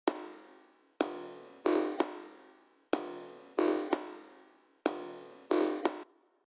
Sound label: basketball dribbling